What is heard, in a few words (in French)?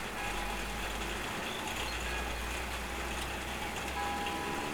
Cloche du village au loin